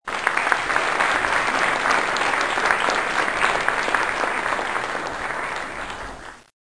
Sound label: game won